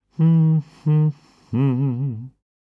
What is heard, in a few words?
voice humming